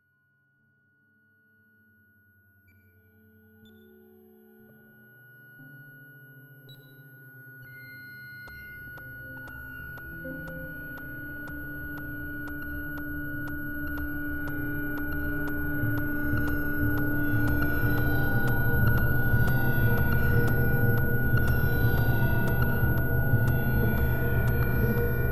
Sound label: machine music